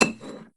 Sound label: wine; barok